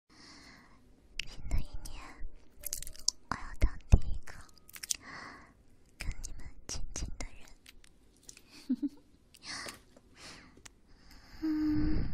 酥麻高能舔耳, Chinese ASMR, Ear lick, mouth sound, tingle; 性感吸血鬼女仆篇小剧场; Brain melting